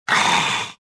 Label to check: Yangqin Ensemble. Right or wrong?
Wrong